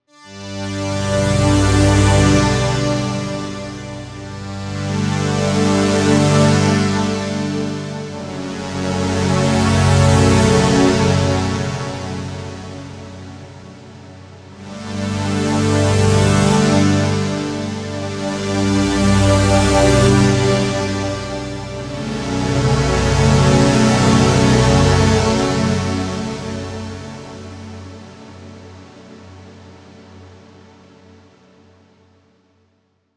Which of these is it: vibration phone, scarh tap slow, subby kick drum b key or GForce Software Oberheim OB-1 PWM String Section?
GForce Software Oberheim OB-1 PWM String Section